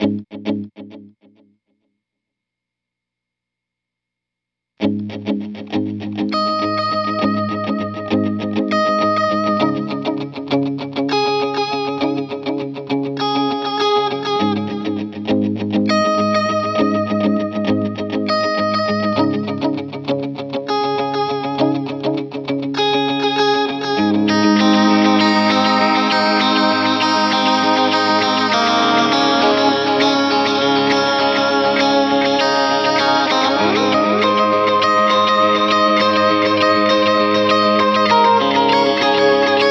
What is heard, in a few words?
Delay, Bad, Modern 8ths